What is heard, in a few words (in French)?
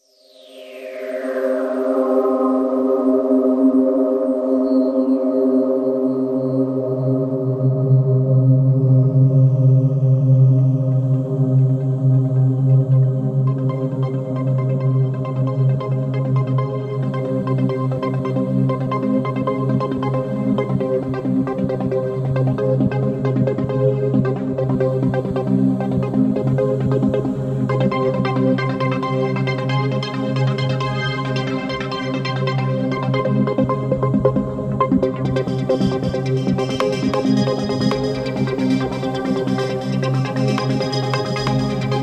AMBIANCE CARTES